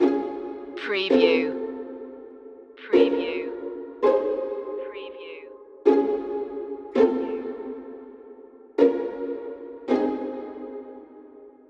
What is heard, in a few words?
entropy humor loop